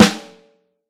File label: Vintage Snare Drum; Q Up Arts; Johnny.C's Vintage Snare Drum; 7X14